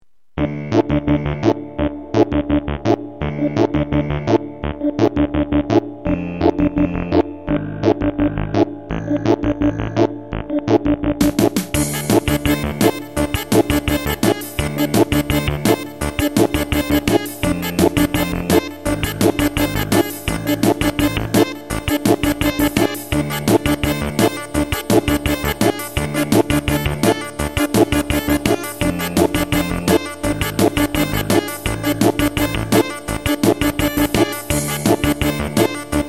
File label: danger, stock game music